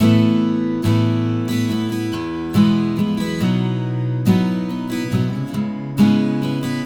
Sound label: Acoustic Pop Guitar 140BPM Amaj; Vengeance - Essential Clubsounds Vol.4